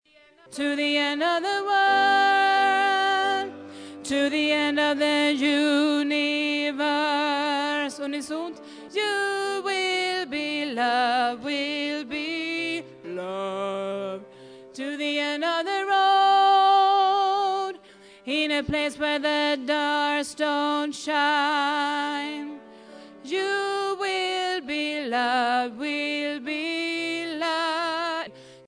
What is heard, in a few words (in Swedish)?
to the end bas, stämmor